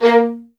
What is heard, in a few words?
MED ATT A#, soft violins; Q Up Arts; Denny Jaeger Master Violin Library